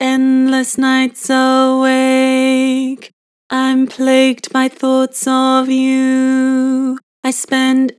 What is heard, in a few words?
Vibrato Endless nights awake, Harmor Vocals